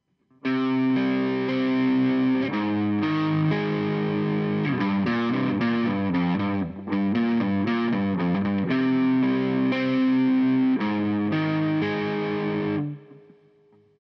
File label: riffs refren, gitara